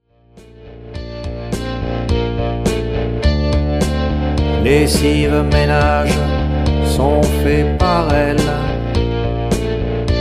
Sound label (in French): Chant